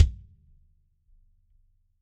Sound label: kick original